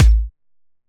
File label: kick layer